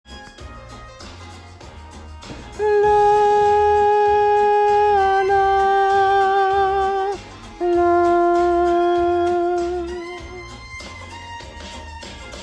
harmo fin

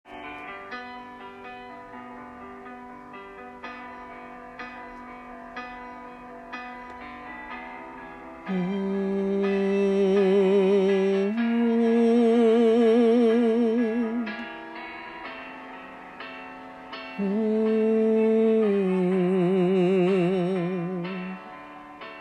prelude vocalises refrain; Prélude vocalises 1er refrain de fin

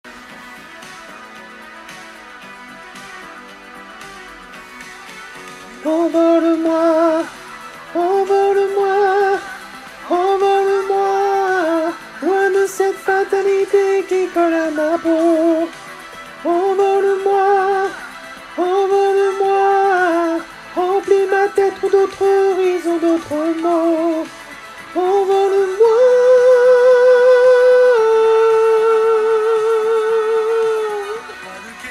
harmo, Harmonie refrain

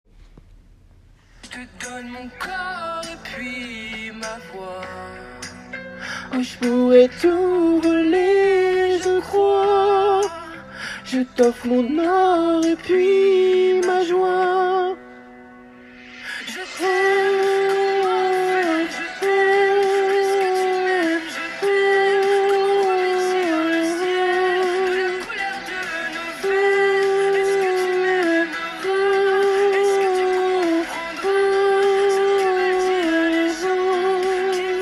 hoshi harmo vocalises

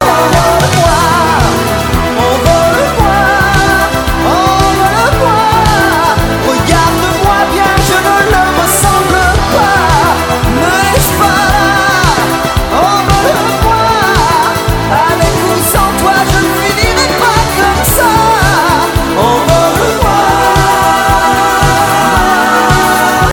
voix fin, Guide voix refrain de fin

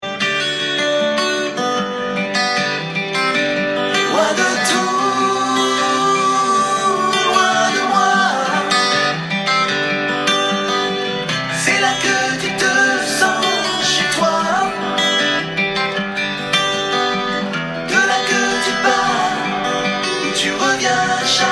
il y a harmo, Harmonie refrain

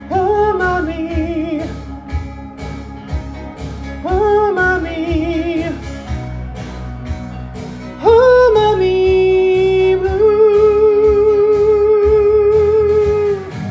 choeur couplet groupe de droite

